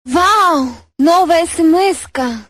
notification ringtone

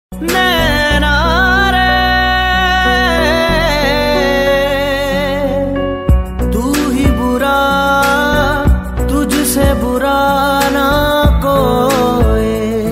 sad ringtone

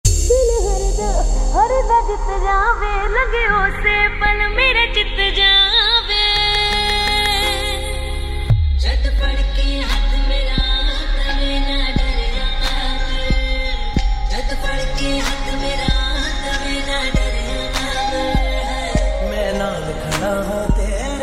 trap ringtone

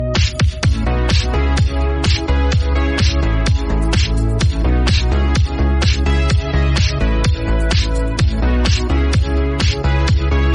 new apple drop ringtone

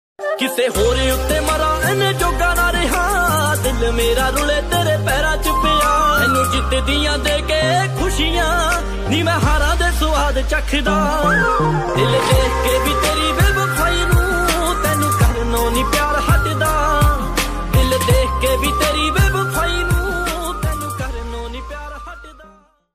punjabi sad ringtone

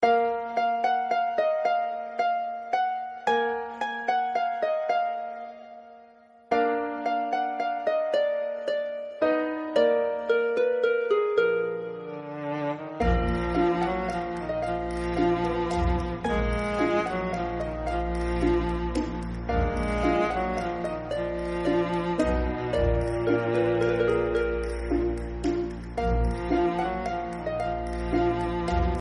piano in French ringtone

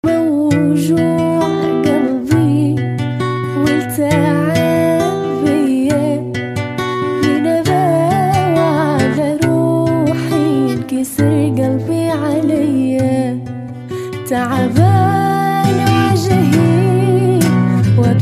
arabic ringtone

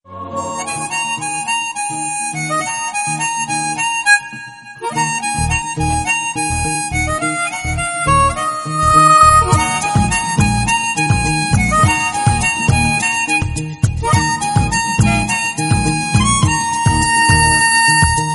romantic ringtone

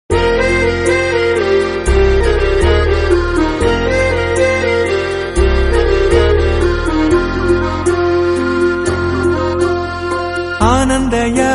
emotional tamil ringtone

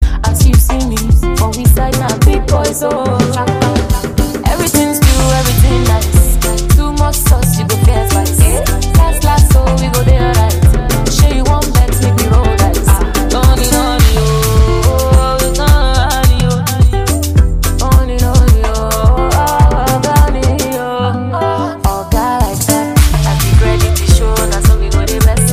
african ringtone

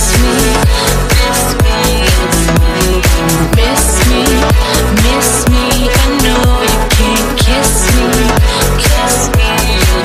hot electro ringtone

